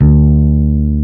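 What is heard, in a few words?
HARD FINGER, Classic Guitars; E-MU Formula 4000 Series Vol. 3; Analog Odyssey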